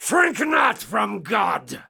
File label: Curse Vocal; NPC; Dominus Combat